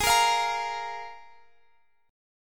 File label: F Minor Double Flat 5th, G sharp